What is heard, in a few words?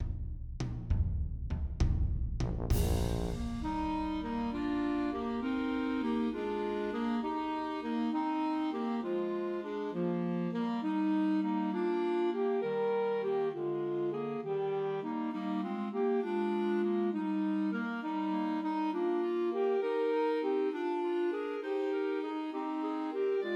Christmas Trios for Two Clarinets and Alto Saxophone; I Saw Three Ships